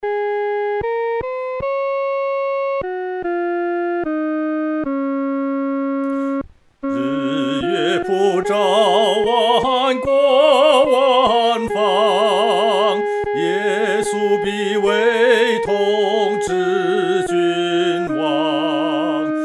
主治万方 独唱（第一声）

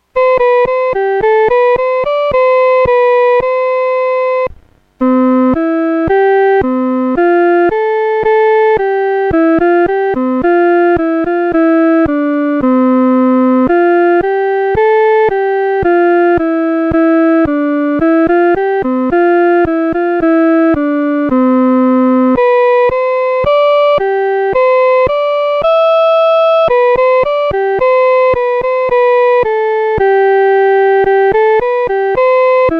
独奏 第一声; 基督今复活

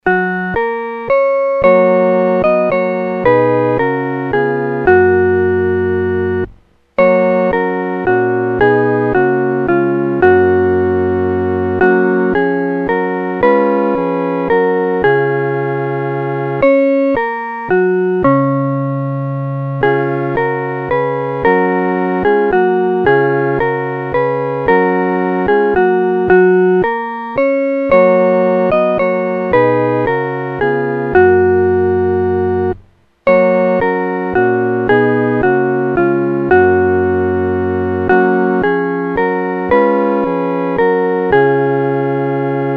合奏 四声部; Come, Thou Almighty King

